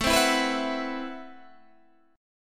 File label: A Minor 6th Add 9th, Guitar, Standard; Am6add9 strummed; 17,15,14,16,17,14